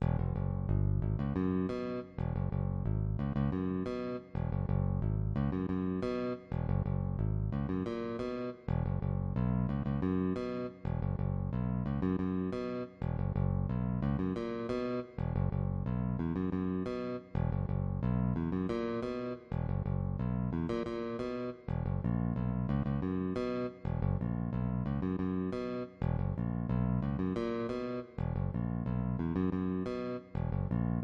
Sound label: bguitar; Creating Melodies